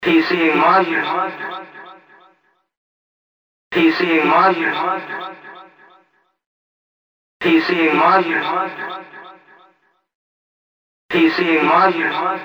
Monster, voice FX, industrial

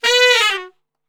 B 2 SAX, Brass Super Section; Best Service

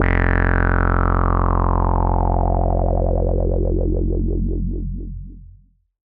bass F1, EMS VCS3; Polyend; Simulacrum Sounds; 1 Shots; Synths

